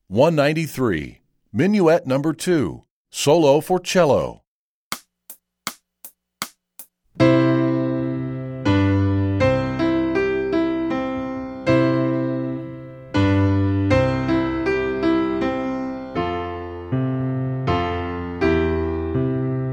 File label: minuet no 2 solo cello